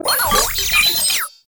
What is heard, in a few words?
Sniper Drone Vocal; Enemies; Warframe; Lasrian